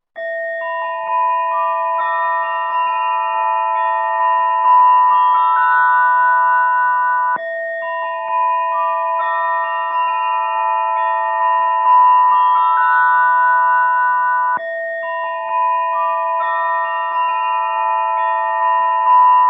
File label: melody; manyo line; takaokaeki